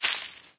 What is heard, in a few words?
grass, player footsteps